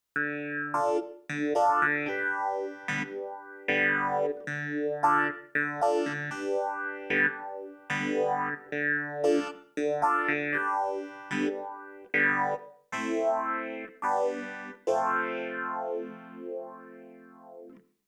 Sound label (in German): EQ Clav BD Phase Pur; JDK Audio